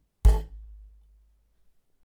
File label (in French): Coup Poutre Centrale très metallique